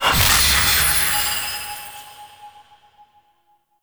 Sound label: Confetti Burst C, Ambience; Warframe; Duviri; Bombastine Encounter